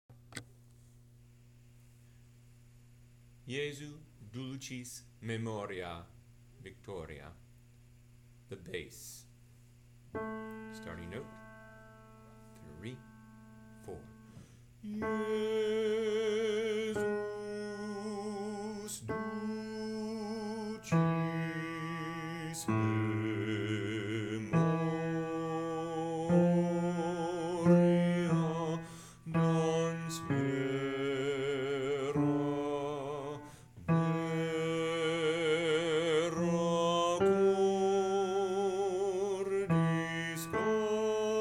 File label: Jesu Dulcis Memoria bass